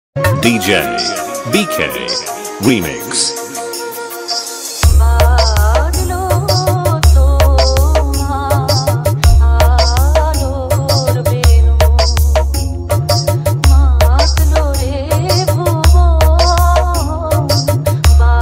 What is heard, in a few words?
Bengali DJ song; ma durga; durga puja 2022